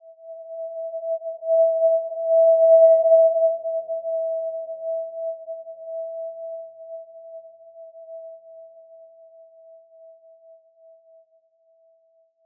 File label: Simple Glow E5 f; HSS-Custom-1; healing soundscapes